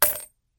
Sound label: money box